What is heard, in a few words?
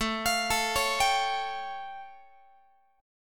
A Augmented 7th A Guitar; A+7 arpeggiated; x,17,20,19,17,18